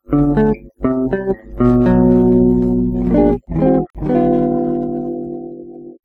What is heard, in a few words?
desktop logout